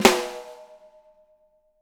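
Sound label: 6X12 ALUMINUM; Living Drums; Sampleheads; Peter Erskine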